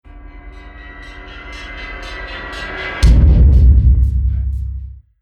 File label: Sinister Horror Impact; miscellaneous impact